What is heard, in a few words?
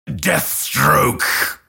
attack pain; Grimstroke